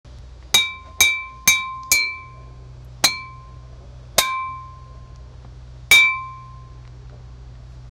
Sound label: a metal pipe clanging as it hits the ground